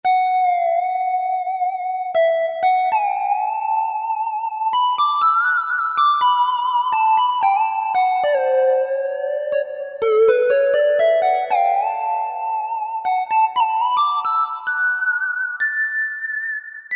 Way Out Lead, Korg MSC-3S

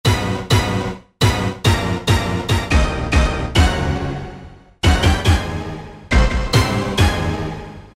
Big Ol' Stab, Roland SR-JV80-06 Dance

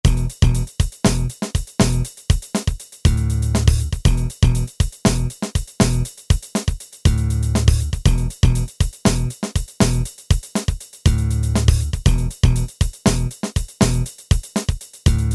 Funk

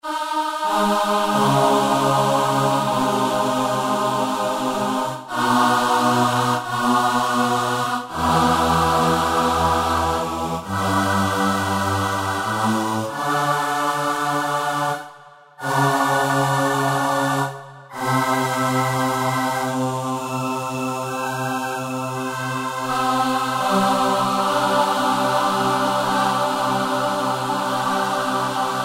Gospl Aah, Choir, Yamaha A4000; Strings - Choir

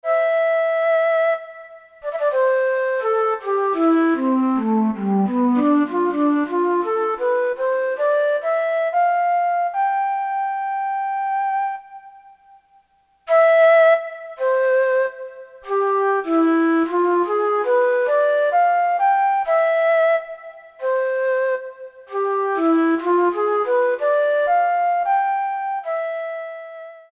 Flute

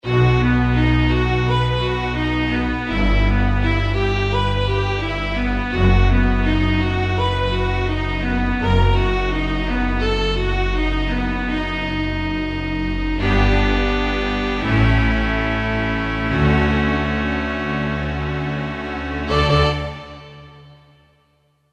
Strings, Kurzweil K2000; Kurzweil K2000 Value Pack; Extra Programs

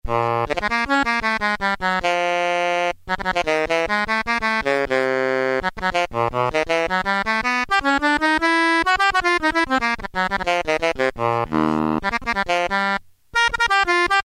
saxophone, Technics SX-K450

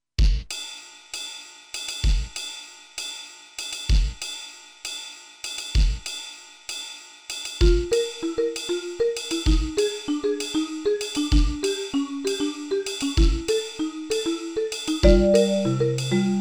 Ambient Drums Vibraphone

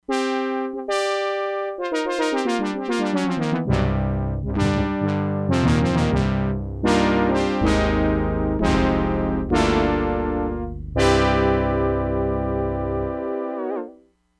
BRASS 5th, Crumar Bit 99; Factory Programs

